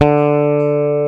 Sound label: BASS D-3, ROCK